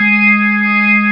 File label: ORGAN B3 HAM G#; Best Service ProSamples vol.55 - Retro Sampler; AKAI